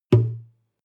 western guitar drum single tonal

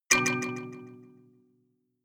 notification kalimba delay minor low

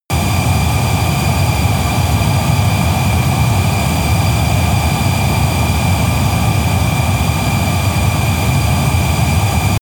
military jet fighter turbine steady noise

